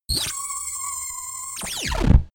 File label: defect laser beam; soundpool